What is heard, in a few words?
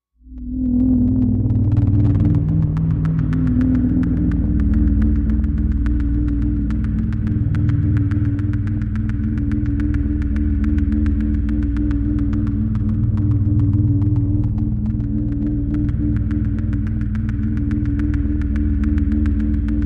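Dark Drone Low Modulation, eerie ambience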